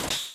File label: confirm; front mission